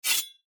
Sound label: slash